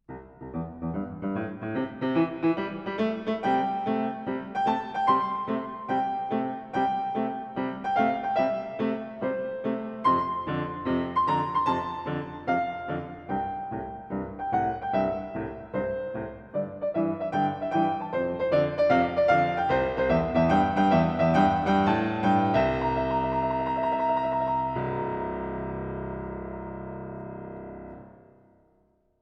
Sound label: Christmas Rock